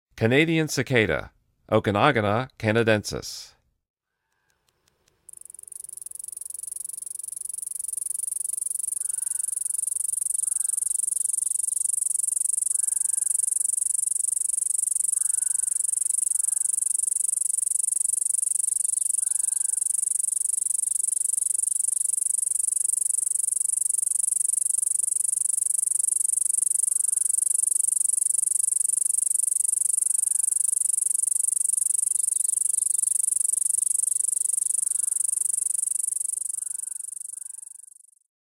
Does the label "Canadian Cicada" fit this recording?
Yes